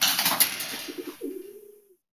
Bird Trapped Metal; Zomboid 41.78.16; Project Zomboid

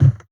Wood Foot; Zomboid 41.78.16; Project Zomboid

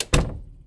door close; Zomboid 41.78.16; Project Zomboid

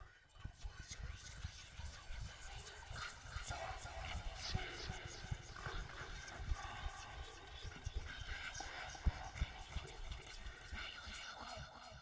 insanity ambient; Zomboid 41.78.16; Project Zomboid

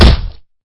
zombie Impact; Zomboid 41.78.16; Project Zomboid

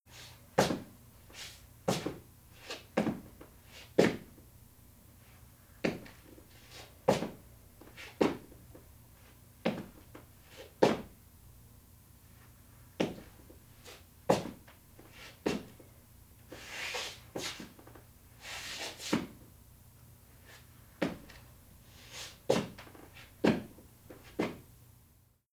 foot scrape male concrete distant; Zomboid 41.78.16; Project Zomboid

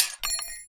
Metal Snap; Zomboid 41.78.16; Project Zomboid

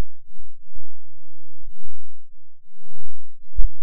Wobble; Ableton Live 10 Suite

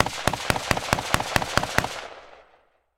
pistol distant; Zomboid 41.78.16; Project Zomboid

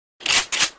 pump action; Zomboid 41.78.16; Project Zomboid

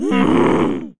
zombie rand; Project Zomboid

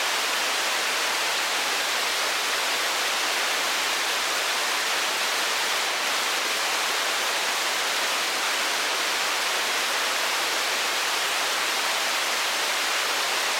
Heavy Rain Fall; Zomboid 41.78.16; Project Zomboid

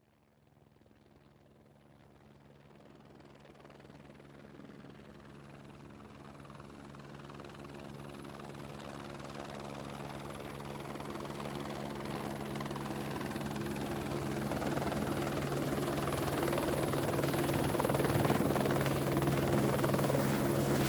chopper; Zomboid 41.78.16; Project Zomboid

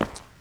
foot tile; Zomboid 41.78.16; Project Zomboid

